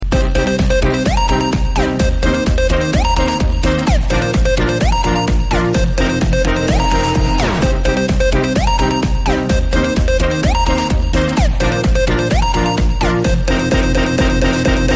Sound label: sheriff win